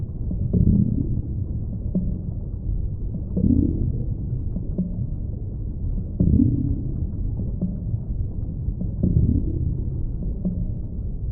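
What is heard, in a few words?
tiyanki idle loop; Stellaris; reanimated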